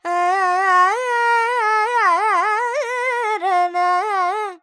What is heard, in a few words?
Dorian, Vocals Carnatic Female Alaps 70 G Dry